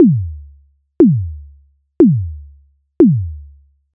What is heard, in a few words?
bass drum kick; ableton live; pe41 d410ms